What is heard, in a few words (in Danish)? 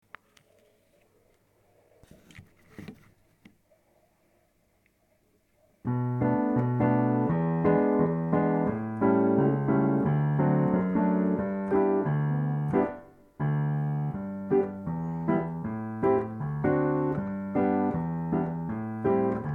akkompagnement; Alle gaar rundt